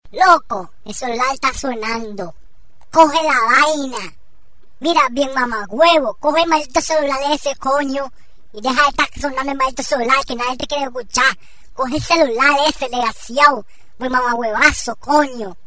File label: Ring Tone Caribeno